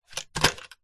leisure toy wooden train large catch two hands

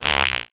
cartoon fart

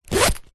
bag rucksack fabric empty zipper; foley; zapsplat

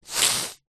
human sniff wet ill

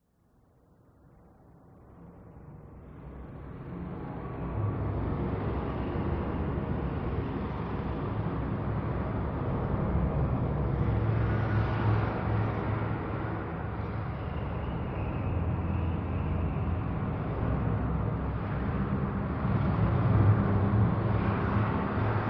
Alien Planet; PMSFX

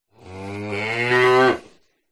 Cow; audio hero

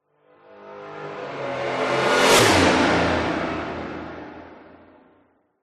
vehicles sports car race drive past fast designed

